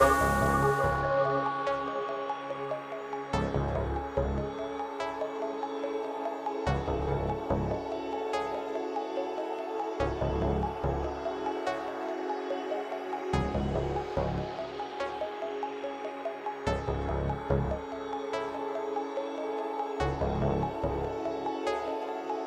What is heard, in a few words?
Bg Menu; Tower Fortress; Android